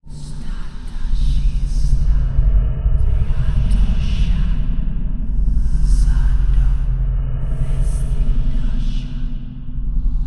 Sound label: quest haunted scroll halloween